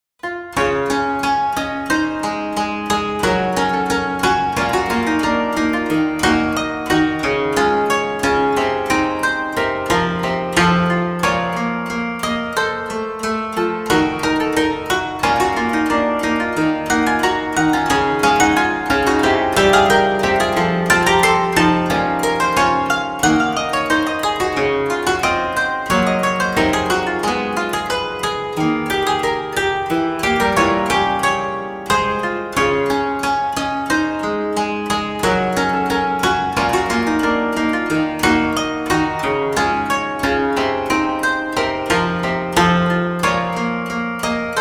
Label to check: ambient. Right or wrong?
Wrong